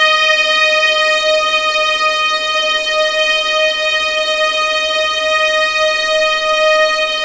D#5, String Collection; East West; The Ultimate String Collection

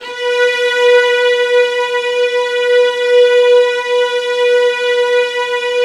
VIOLINS C#, Strings; ILIO; Synclavier Strings

